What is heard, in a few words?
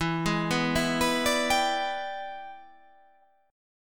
E 7th Sharp 9th, chord